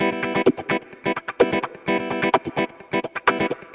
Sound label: Electric Guitar Kit E min, 128BPM; Vengeance Samples; Special Sounds